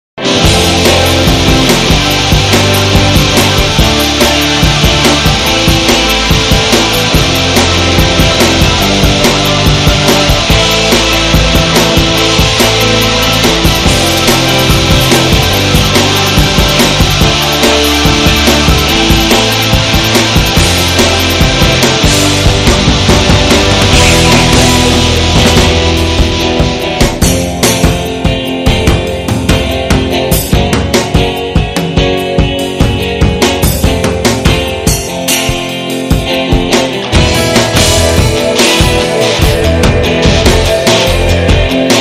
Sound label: Chorus